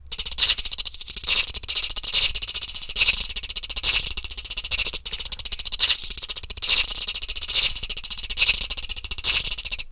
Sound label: Rumble Loop